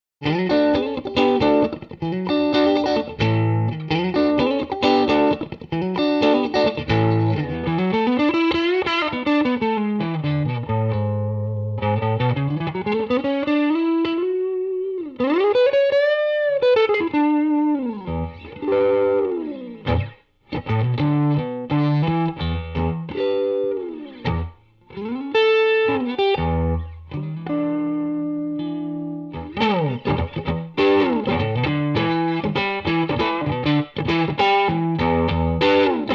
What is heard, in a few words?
Neck And Combo Clean And Blues